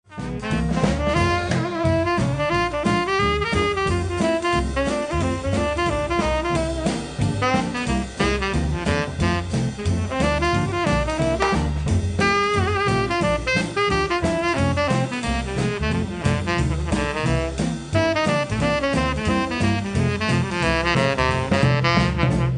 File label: sax